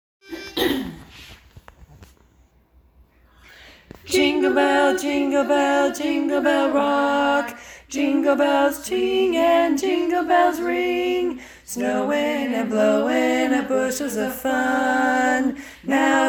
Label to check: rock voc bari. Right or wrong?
Right